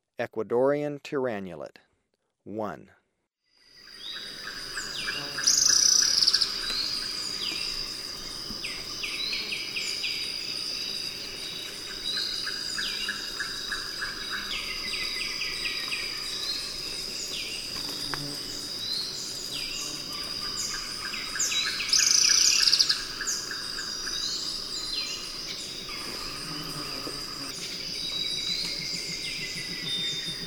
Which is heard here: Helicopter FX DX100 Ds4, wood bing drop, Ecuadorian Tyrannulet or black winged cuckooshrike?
Ecuadorian Tyrannulet